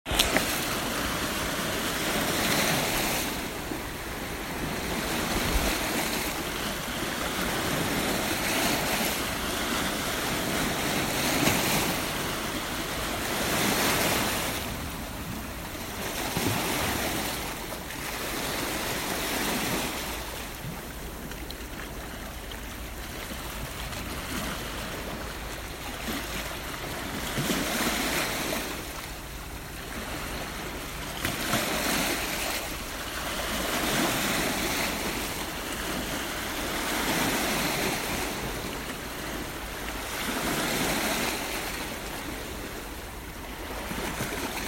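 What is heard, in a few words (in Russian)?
volni na plyazhe, waves on the beach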